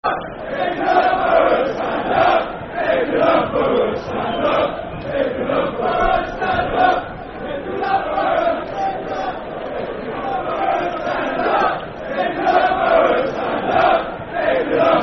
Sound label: Football Chant, Soccer Song; Stand Up If You Love Boro; Peterborough United Pufc